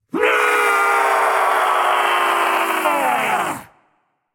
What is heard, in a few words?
battlecry; smite; arthur